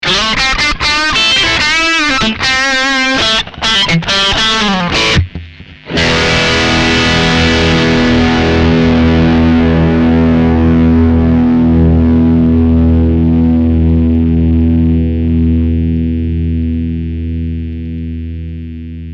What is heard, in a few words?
dirty bridge mono; axetec